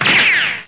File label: Moviestar Runs